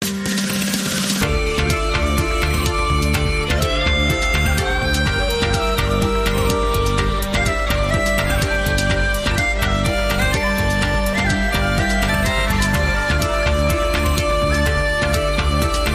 Celtic Trance

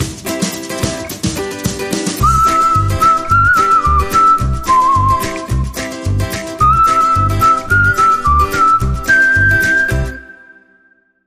Whistling

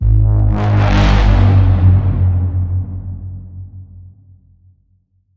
Bend, G sharp

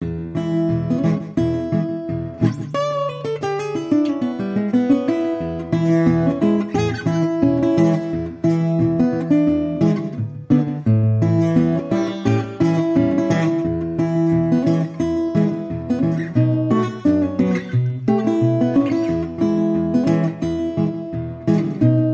Bluesy Finger Pickin

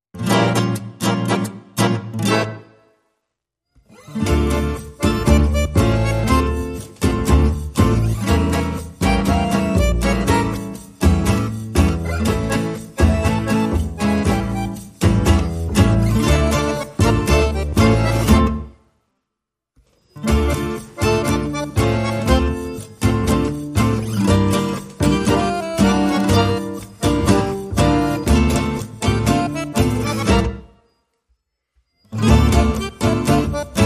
No Drums No Fiddle

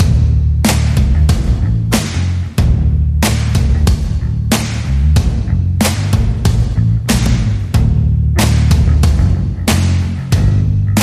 Claps and Rock, Underscore

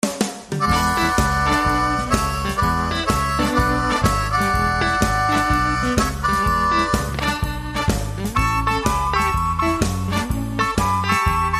Shuffle

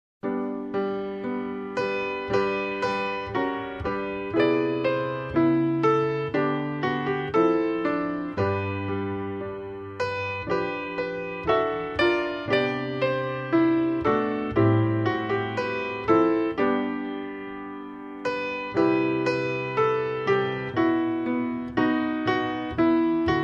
Oh Little Town Of Bethlehem, Solo Piano

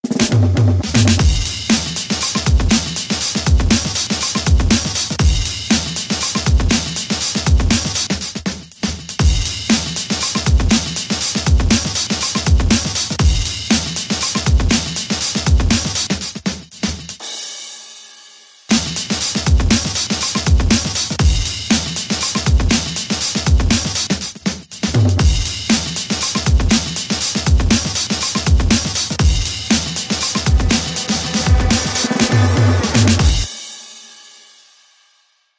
Keychain Beat

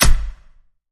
Fairway Swings Clicker

